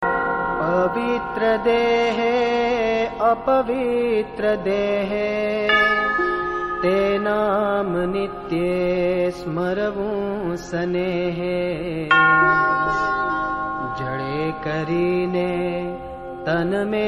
Dhun; Swaminarayan

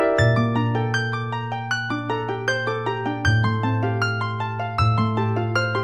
Sms

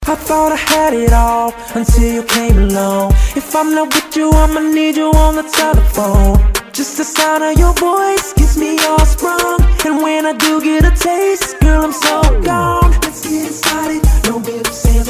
Remix

